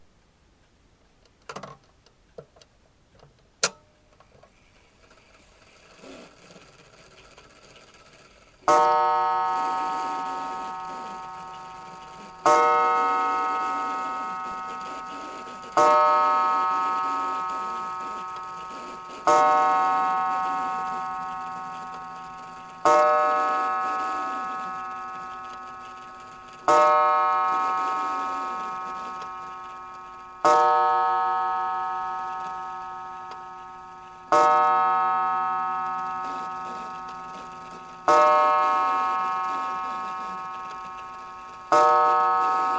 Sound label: clock chiming